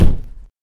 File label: Thud